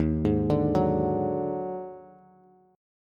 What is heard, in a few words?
D sharp Minor Major 7th Double Flat 5th, Bass; arpeggiated; chord; 11,11,12,11